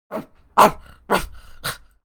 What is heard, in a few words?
ruff raff, bark